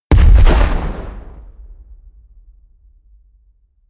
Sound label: explosion fuel; comedy-cartoon; soundpool